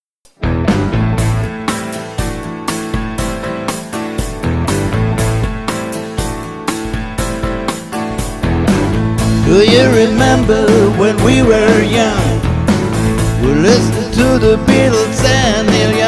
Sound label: Swinging Sixties